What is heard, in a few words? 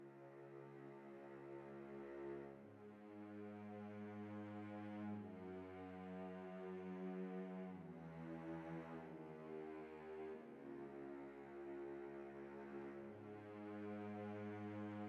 Celli Leg (D)